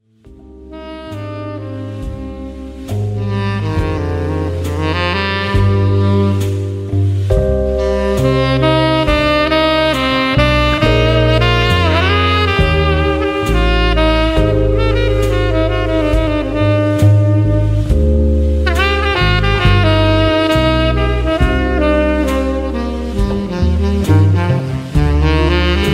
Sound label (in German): Tenor Saxophon